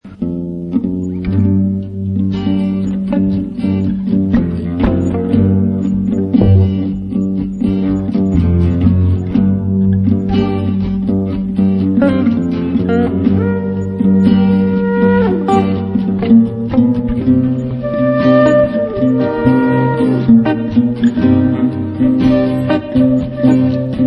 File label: blues